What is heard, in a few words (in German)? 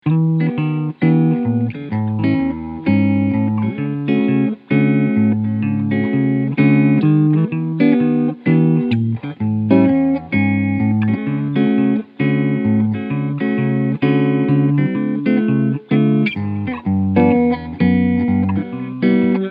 Harley Benton HB-35 Plus Cleansound beide Humbucker